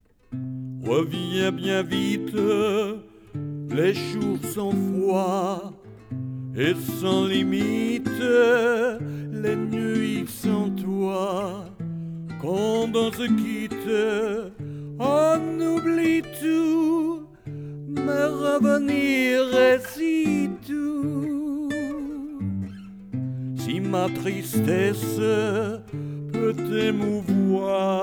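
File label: stanza 2 and verse vocals and guitar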